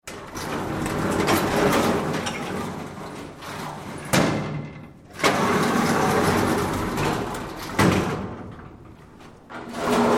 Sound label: Door Slides Large Metal doors, Various slides; Sliding Doors; Tom Hutchings